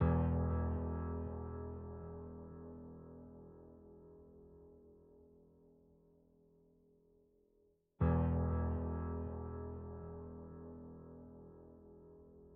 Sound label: Bass